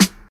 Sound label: Reggae Acoustic Snare Sound G Key, steel snare drum sound; snare drum samples